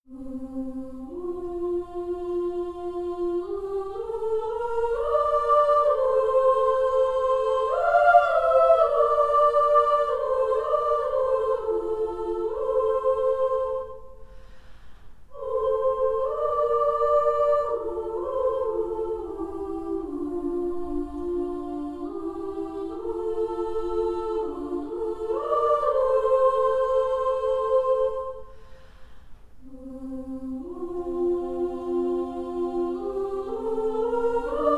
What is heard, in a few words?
VOXOS Legato Sections, Voices and Choirs; Cinesamples Voxos Epic Virtual Choir